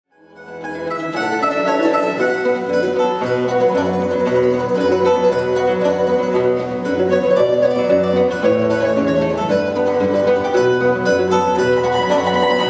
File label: mandolin